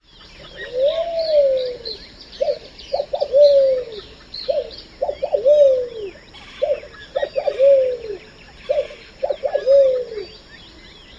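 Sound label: red-billed pigeon cooing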